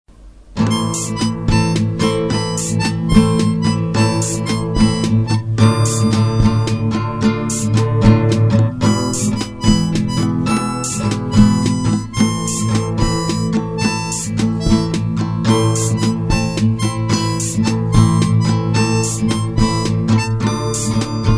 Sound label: melody